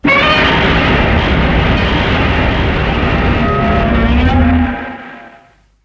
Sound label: Roar; Shin Godzilla